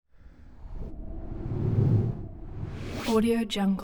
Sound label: whoosh vocal flying breathy swoosh